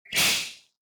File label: snake attack